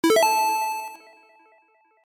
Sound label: Pickups dime pickup; SFX; The Binding of Isaac